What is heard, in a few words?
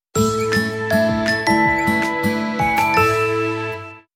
sms; Mayo Chiki